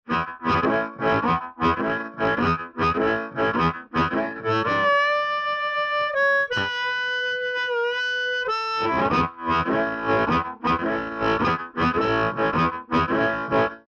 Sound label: Harp Break Up; TubeUlent